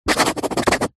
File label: pen rollerball sign signature paper pad; office